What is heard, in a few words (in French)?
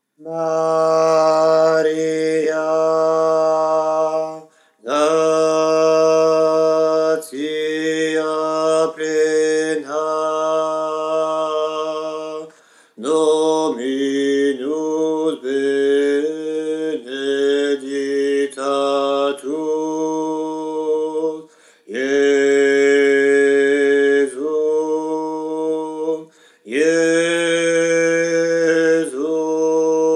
basse; ave maria oriental